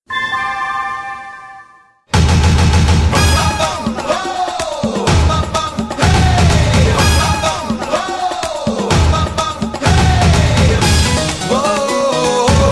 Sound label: floor music; simone biles